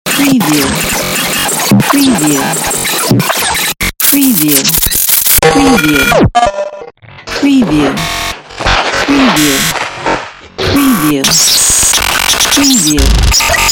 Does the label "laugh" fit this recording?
No